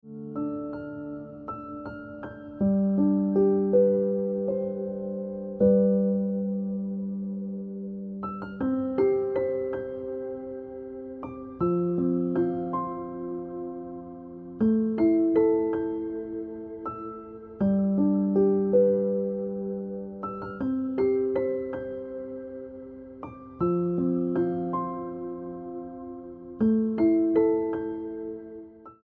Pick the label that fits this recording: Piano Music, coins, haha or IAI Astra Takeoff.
Piano Music